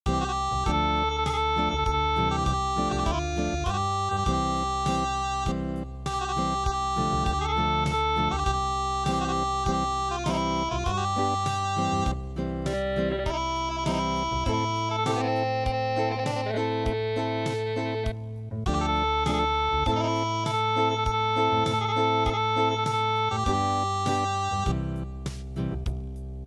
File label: Unplugged